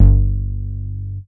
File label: MOOG BASS G2; Best Service Dream Experience